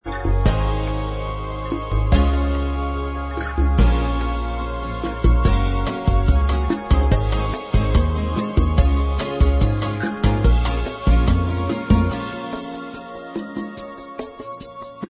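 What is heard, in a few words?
bossa instrumental track, backing tracks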